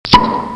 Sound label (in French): Bouchon, bruitage Liquides